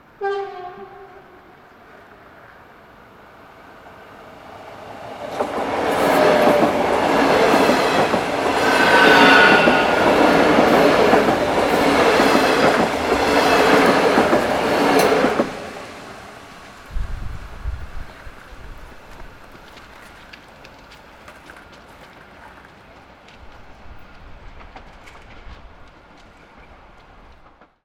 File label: Fast train